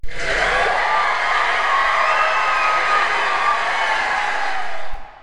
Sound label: Cheering